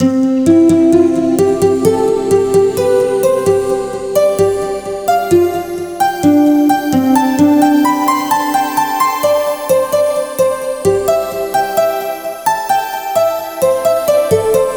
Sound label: Harp Build